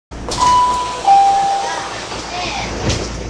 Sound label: door; tokyu5050